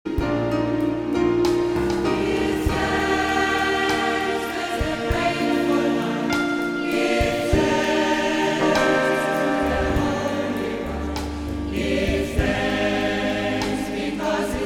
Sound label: Give thanks, gospel singers